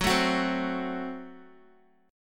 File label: Gb Diminished Guitar; strummed; JGuitar